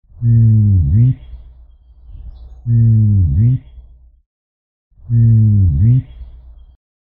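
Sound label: ostrich voice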